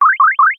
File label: cartoon hair or whisker twang